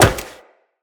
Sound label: Wall Jump Metal, Footsteps; Wall Run; Characters; Tenno